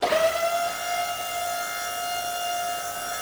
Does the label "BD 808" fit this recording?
No